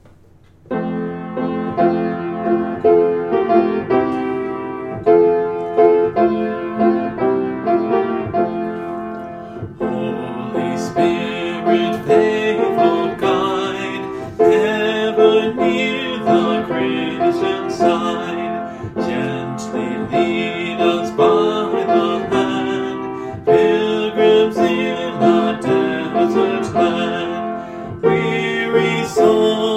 Holy Spirit, Faithful Guide, singing through the hymnbook; Great Hymns of the Faith